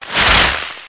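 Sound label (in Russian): ракета фейерверков запускает мгновенный звук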